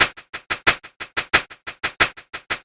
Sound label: short beat musical tone ringtone